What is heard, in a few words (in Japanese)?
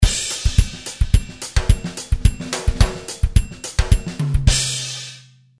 トライアングル